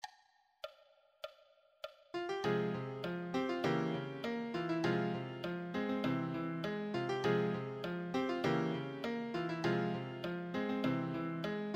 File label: advanced solo, piano